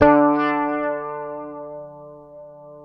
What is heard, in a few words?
FINGERED, Bass; D3; Sampleheads; Will Lee Bass Library